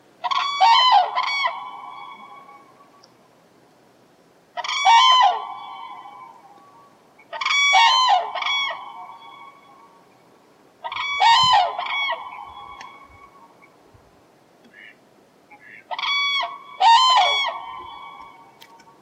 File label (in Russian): Звучание журавля